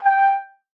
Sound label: G5, TRUMPET; Best Service ProSamples vol.42 - Session Instruments; PS-42 Session Instruments